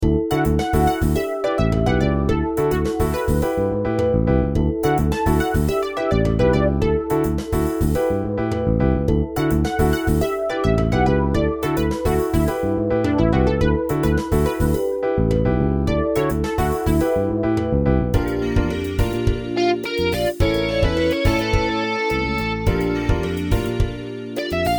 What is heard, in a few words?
main loop; tenaPuyo